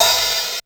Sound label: hihat open, Casio RZ1; drum machines